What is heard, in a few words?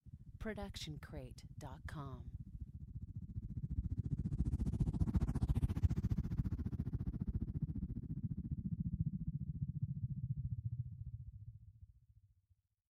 helicopter pass by; soundscrate